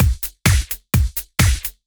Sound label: Clubhouse Loop 128 BPM